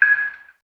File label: electric light blink; The Swapper